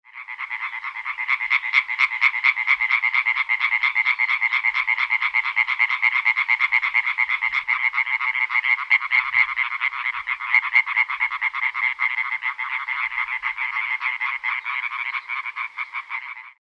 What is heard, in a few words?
Hyla arborea; Guenter Tembrock; tierstimmenarchiv